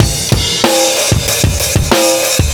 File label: triplet, breakcore breaks; silentkillerbreaks